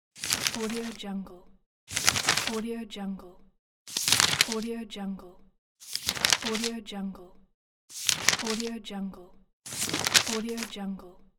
paper flips

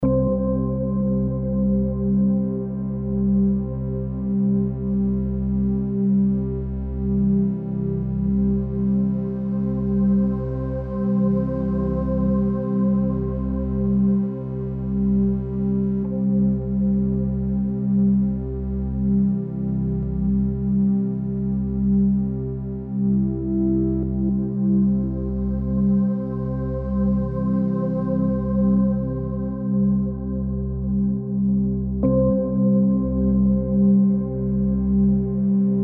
deep binaural drone delta

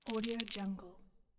shaking playing dice

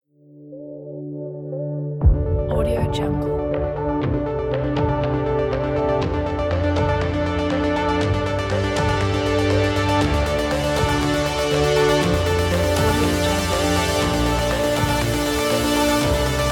inspiring timelapse electronic

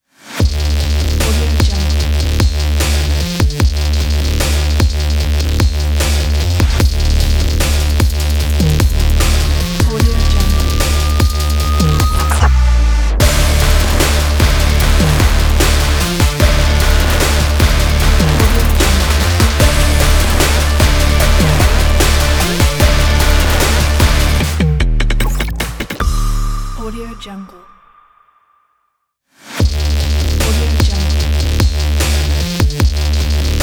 energetic sport promo logo

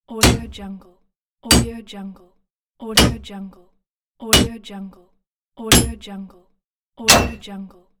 metal thump

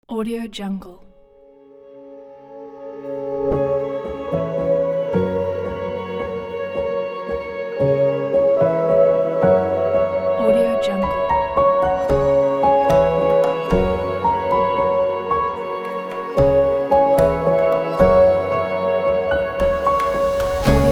cinematic fantasy

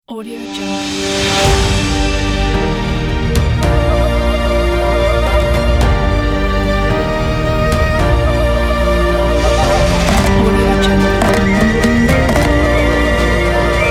upbeat dombra

